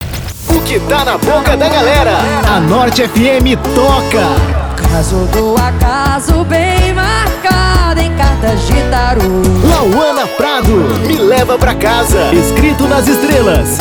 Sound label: intro music power